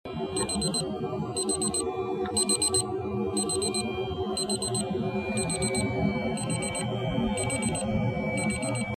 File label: enermax beep; enermax uc-a07fatr2 front panel